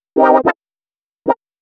120 synth licks C, Keys, Nu Jazz House; PowerFX

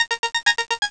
arpeg; Amiga Soundtracker Sample Packs

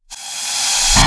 REV, SOUND EFFECT REVERSE; AKAI S6000 CD-ROM - Volume 1

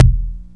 Bassdrum short, Moog mg-1

